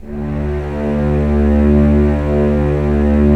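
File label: STR String Section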